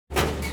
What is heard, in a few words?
scrap metal junk; nova scrap yard; junk yard metallic and impact fx; nova sound